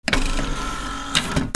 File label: HOUSE